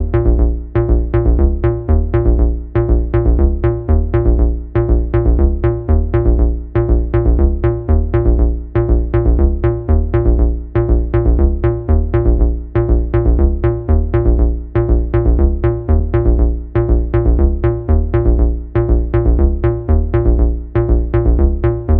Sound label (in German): Analog Bass mit EQ